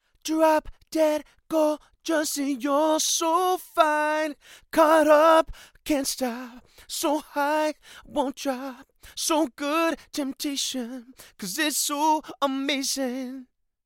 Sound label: male vocals; lewitt